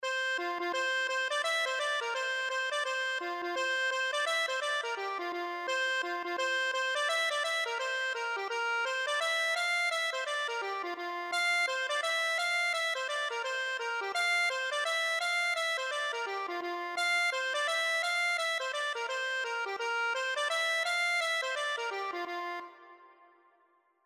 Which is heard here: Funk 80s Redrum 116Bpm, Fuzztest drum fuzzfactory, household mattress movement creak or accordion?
accordion